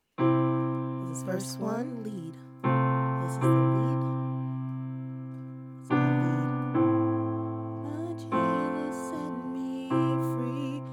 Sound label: Full Choir; summer choir